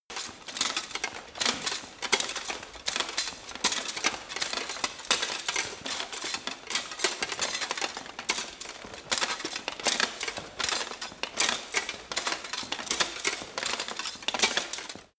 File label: walk sequence